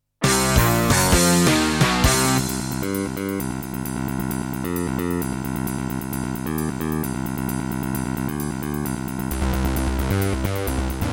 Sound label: Roland SC-33; sigil doom episode 5